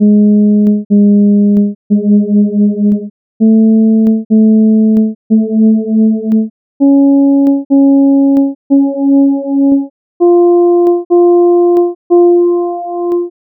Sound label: recursive ji note splits